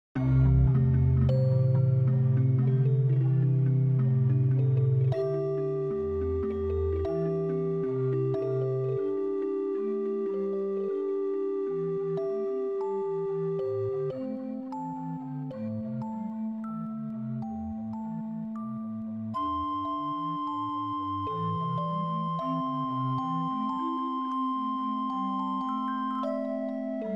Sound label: comma clouds tune